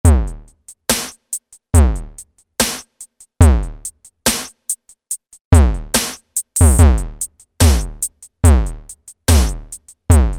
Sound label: Analog Rhy Kit, Roland SRX-07; Roland SRX-07 Ultimate Keys